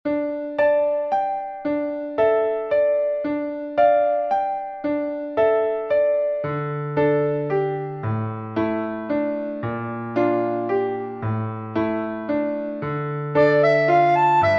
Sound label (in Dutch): snelle karaoke